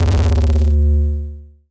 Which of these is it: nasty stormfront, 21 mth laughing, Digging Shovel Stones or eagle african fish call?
nasty stormfront